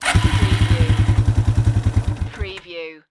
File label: Quad Bike Start Up Shut Off Engine Perspective